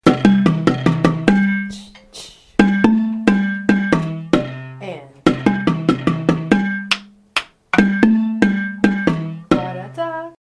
baritone, marimba; chiradza

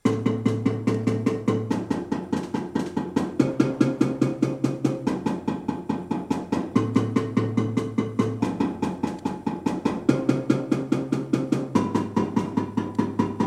bass b part, marimba

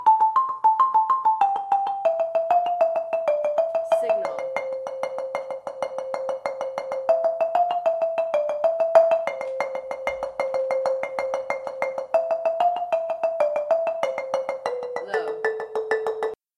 lead rolling signal, marimba; low signal

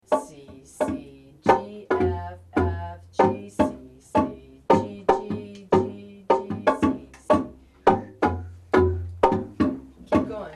bass, marimba; babamudiki

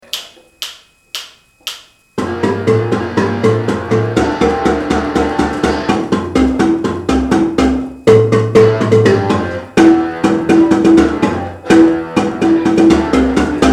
bass outro, marimba; carnival

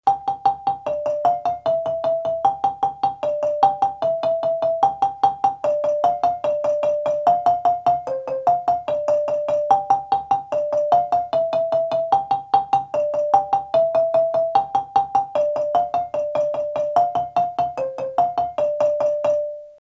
lead middle rolling, marimba; kare mugomba

